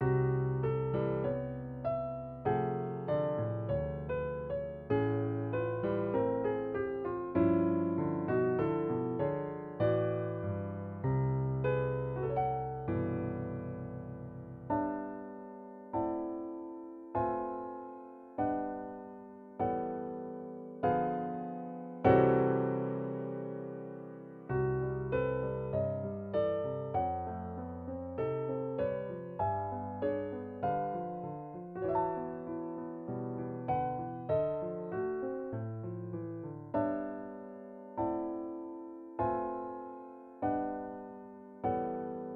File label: bitonal